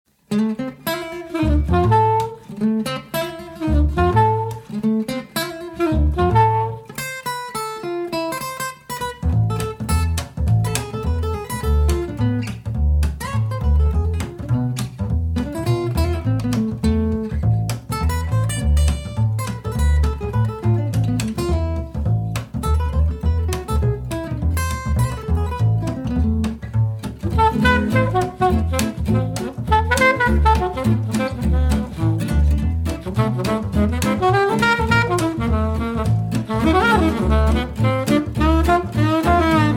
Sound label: jazz